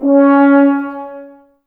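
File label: Brass Horns C#; Sample CDs; Miroslav Vitous; Woodwind Brass Ensemble; F.Hrns Links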